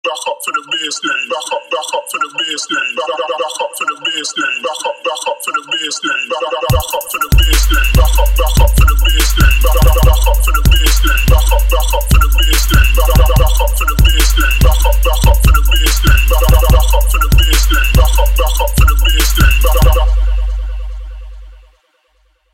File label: Trapstep